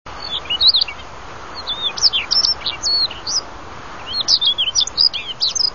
słowik rubinowy - Luscinia calliope; ptak dotychczas w Polsce nieobserwowany; wroblowe; turdidae

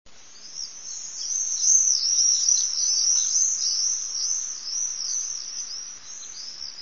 jerzyk, głosy

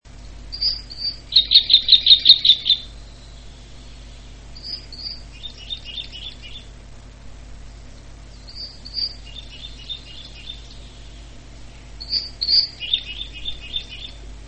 krzyżodziób świerkowy

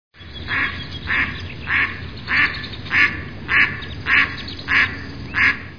krzyżówka, głosy; Oprócz kwakania jest też gwizdanie kaczorów w czasie zalotów

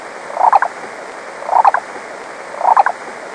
kukawik czarnodzioby

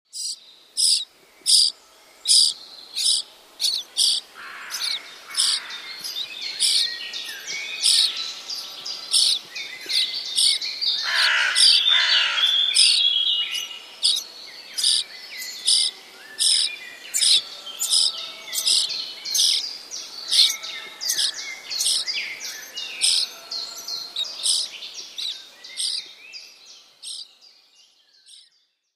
pisklaki

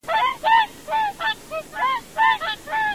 łabędź krzykliwy - głos

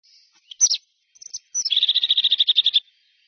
czubatka; wroblowe; sikorowate

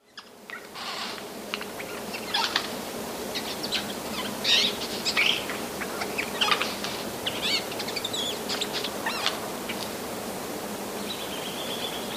kowalik w mrozie, tryle kowalika; 23 stycznia 2016 roku, gdy było mroźno; w lesie; Przedstawione nagranie pochodzi z 23 stycznia 2016 roku, gdy było mroźno

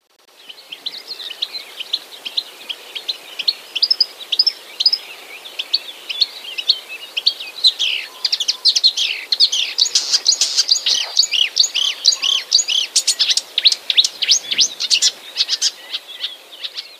lozowka, łozówka naśladuje szczebiot szczygła; wroblowe; zapozyczenia

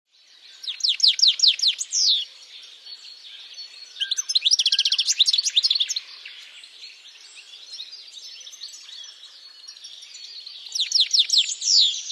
szczygieł - śpiew; szczygieł na tle innych ptaków

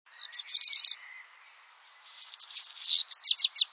kopciuszek - śpiew, pełna zwrotka; wroblowe; turdidae

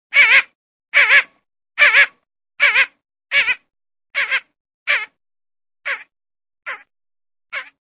cyranka modroskrzydła; wroblowe; anseri

